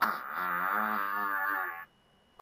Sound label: haunted house wooden door